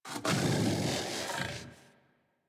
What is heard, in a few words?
Armored Vocal Breath Walk; Enemies; Kubrow; Warframe